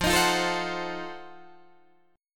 F 7th Flat 9th, Guitar Open D

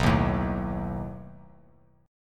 B 7th B down; B7 strummed; JGuitar

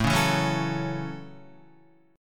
A 6th, Guitar; strummed

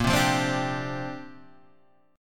C 7th Suspended 2nd Suspended 4th A sharp

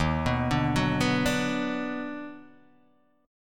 Eb Minor Major 7th Sharp 5th, Guitar Open D

